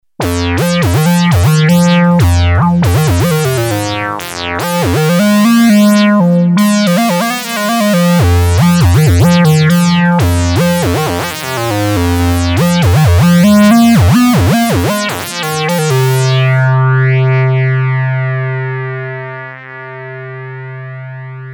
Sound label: synth moog funk; Creamware minimax